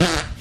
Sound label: fart short